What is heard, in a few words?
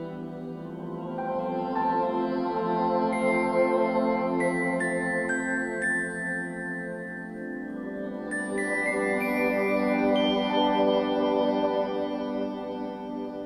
ANGELIC LULLABY